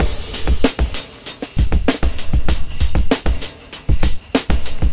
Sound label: 96 kik lofi, drum loops and breaks